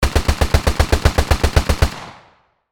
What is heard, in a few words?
AK-47 assault rifle full auto fire; gfxsounds